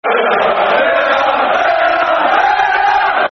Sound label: Villa Villa, Villa Villa Football Chant; Aston Villa Avfc